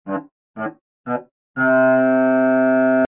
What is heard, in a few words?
stacatto